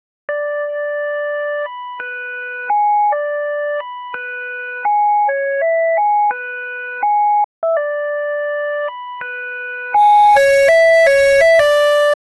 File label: happy birthday song